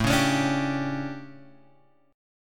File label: A 11th A, guitar chord; A11 Chord {5 4 X 6 3 3} strummed